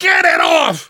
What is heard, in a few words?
scream while pounced; player; survivor; voice